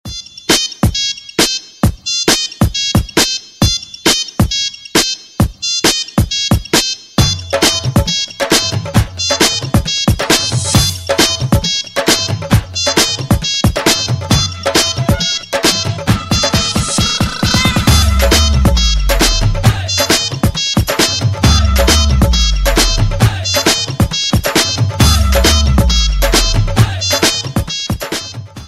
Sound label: nokia bhangra ringtone; android